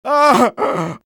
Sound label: engineer pain severe; svencoop addon; tfc; player